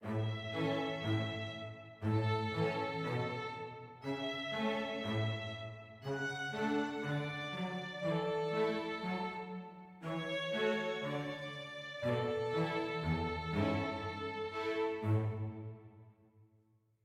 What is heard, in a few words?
happy med